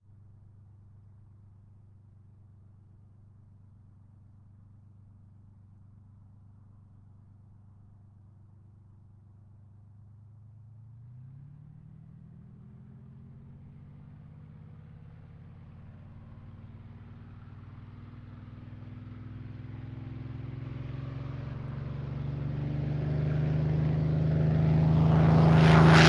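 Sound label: spitfire mk ix exterior take off by right position; Sonniss GDC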